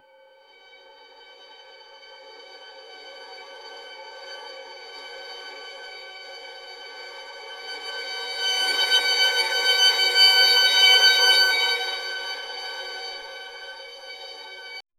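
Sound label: high pitched violin screech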